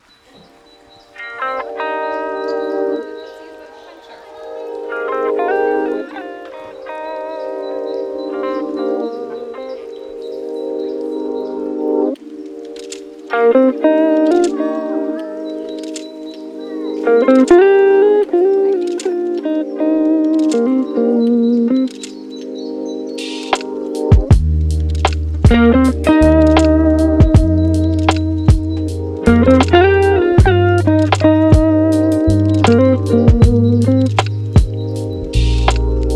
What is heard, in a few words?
lofi hip hop